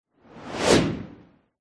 sound design fast whoosh; zapsplat

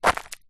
foley footstep single stoney path; zapsplat

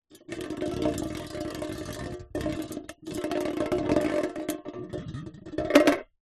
foley tin roll concrete close up

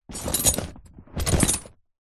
horse saddle leather metal set down jangle

